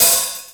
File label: cymbal; osu